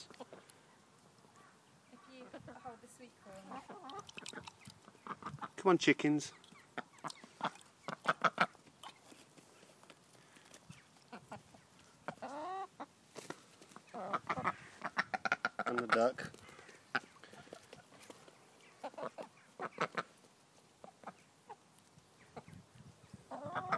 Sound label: feeding chickens and a duck